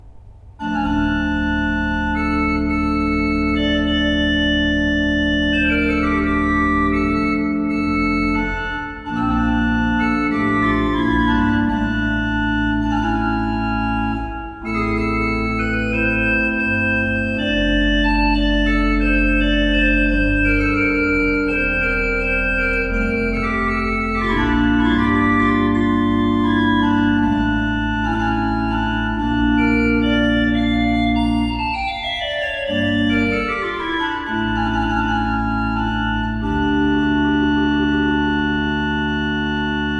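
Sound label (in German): Van Vulpen 12 II P, pfeifenorgeln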